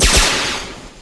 Projectile Tracer double; Earth and Beyond; EA Games

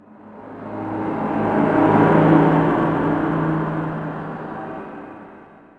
city, zones; Zoom3City

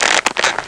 creak

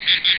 CRICKETS; PowerMonger

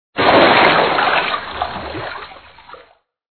water in; tobby on ice

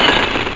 E CRACK; dragon

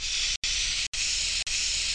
SQUEEGIE; Marbles

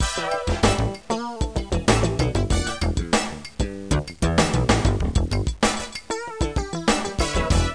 dance; My Science Fair

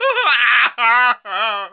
CHCK CRY; tenpin

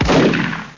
shotgun; wormnoiz

